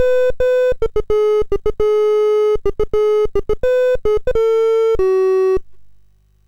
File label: MOS6581R2, GAMES; Sammy Lightfoot